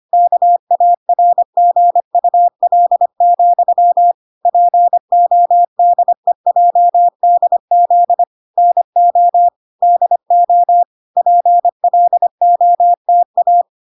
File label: 25wpm